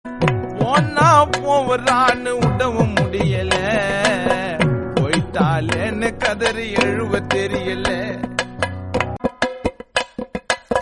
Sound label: Tamil Song